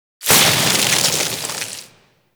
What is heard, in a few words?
Vent Iced Explosion A; Warframe; Corpus; Arachnoid Camper Terra; Death